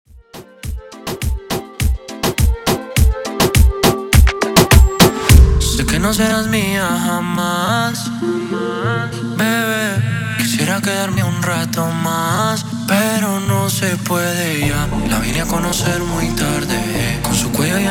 Intro Outro 103 Bpm

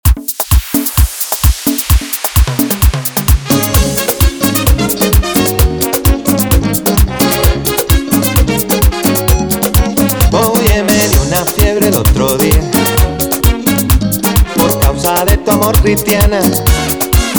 intro outro 130bpm

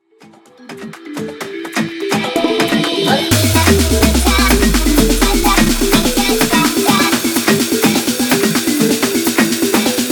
afro remix 125 bpm

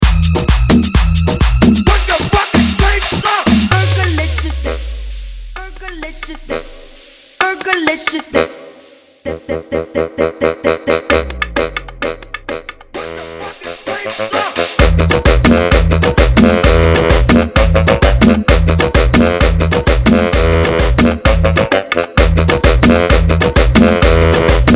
Original Mix 130 BPM

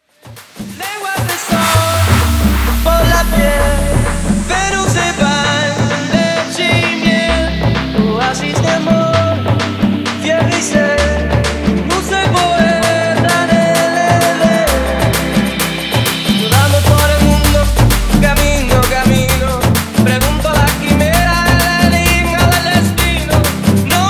intro outro 130 bpm

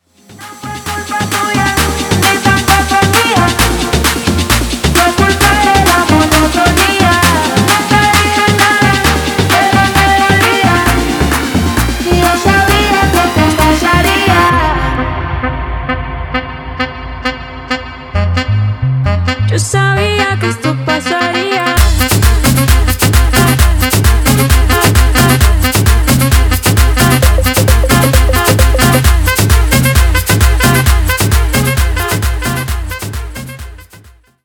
guaracha Remix 130 bpm; Reggaeton dj remixes; Prodeejay Remix

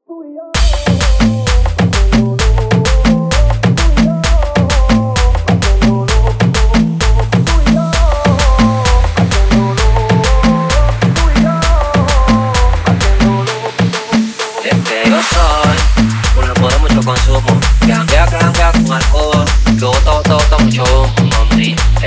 buscando money intro outro 130 bpm

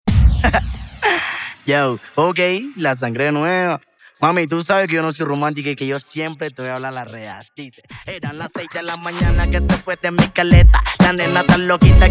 50 50 Open Show 92 Bpm

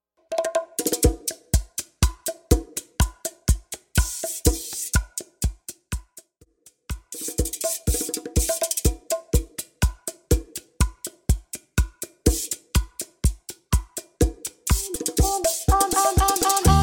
Bachata 123 BPM; Bachata Project; bachata bootleg remix clean

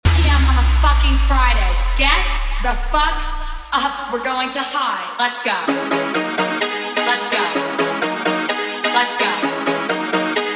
afro 128bpm; Bootleg Edit

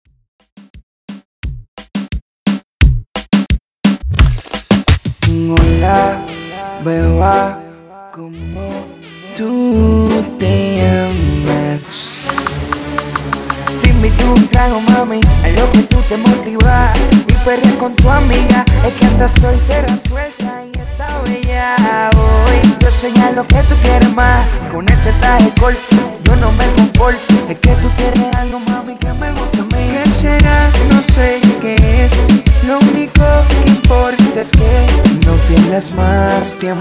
Intro Break 87 BPM; Prodeejay Remix

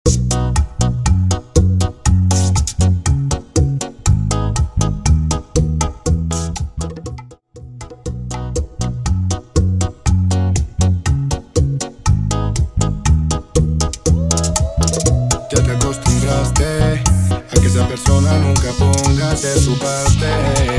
Bachata Project 120 BPM

